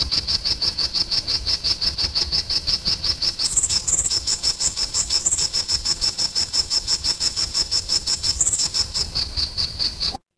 ambience forest

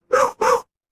animal dog bark

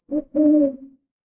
animal owl

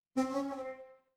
feedback confirm

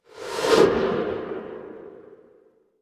transition whoosh

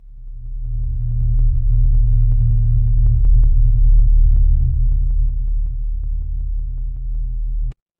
horror drone

